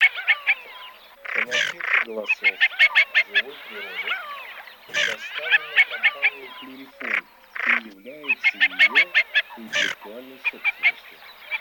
Красноголовый нырок Moriglione Хохлатая чернеть Moretta; plurifon

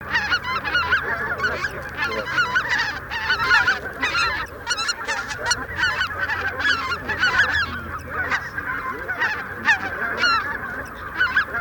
Белолобый Oca Lombardella

